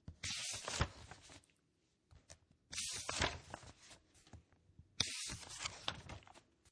Large Book Turn 3 Pages, Turn pages, paper slide, paper movement; Classroom Sound Effects; Schools; Lynne Music